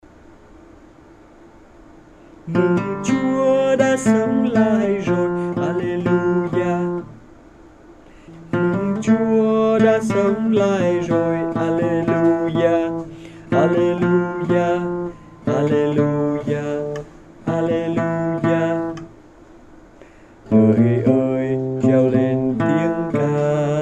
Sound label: Alto; tap be